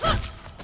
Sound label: attack; kena bridge of spirits